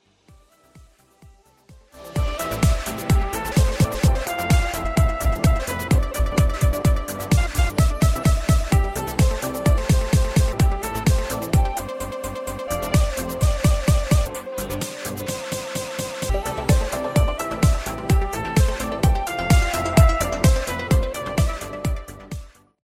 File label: samsung j4 core ringtone